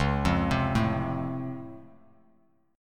C sharp Augmented Major 7th down Bass Standard, chord; C#+M7; arpeggiated; JGuitar